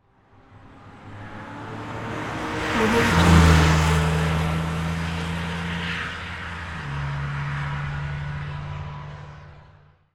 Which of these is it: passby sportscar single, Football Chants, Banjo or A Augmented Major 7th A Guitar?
passby sportscar single